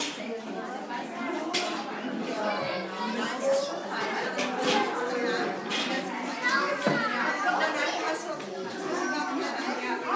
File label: ambience of a playroom